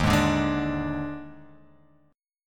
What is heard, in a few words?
Eb Major 7th Suspended 4th; strummed; x,x,1,1,2,3,4,x; EbM7sus4